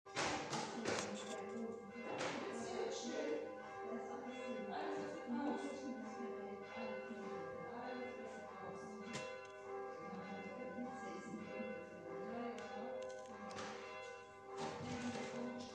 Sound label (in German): glocken Martinroda; Glocken-Anlage